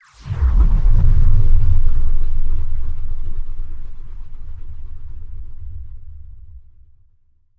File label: Wobbling Large Whoosh